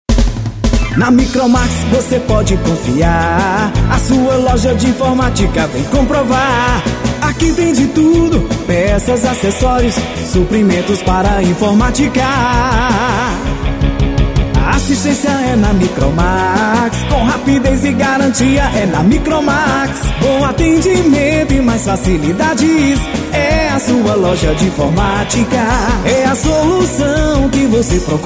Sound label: JINGLE; MICROMAX INFORMÁTICA